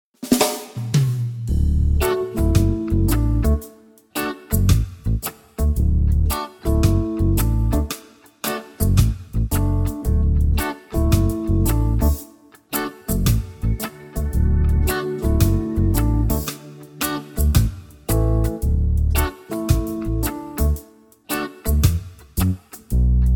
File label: D No Backing Vocals, backing tracks